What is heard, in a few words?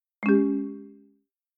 New Message